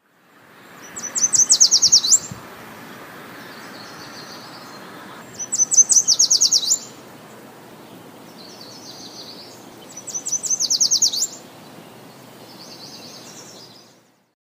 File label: Yellow Warbler